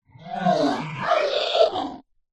science fiction alien vocalisation

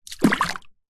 water deep light plop splash; nature

